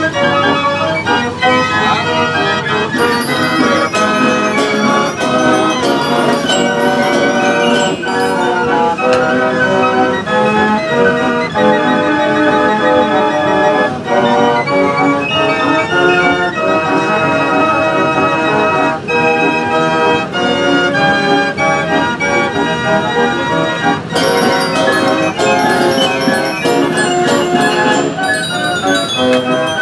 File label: organ; mighty marenghi